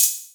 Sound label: crisp open high hat g sharp key; open hat samples